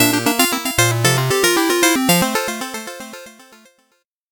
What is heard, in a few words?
8bit jingle slow